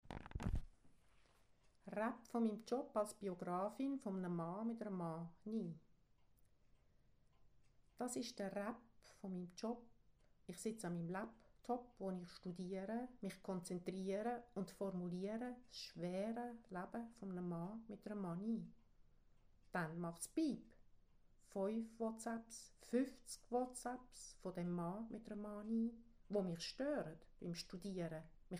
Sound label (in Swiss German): Rap vo mim Job